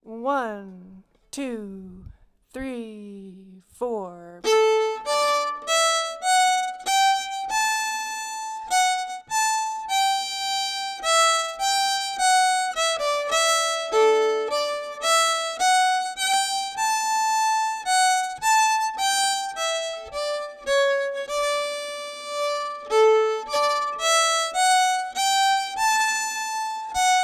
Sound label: mistwold slow, fiddle